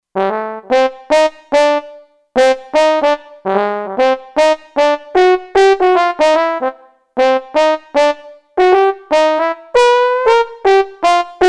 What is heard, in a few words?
Sfz Bone, Kurzweil K2500X; Factory Programs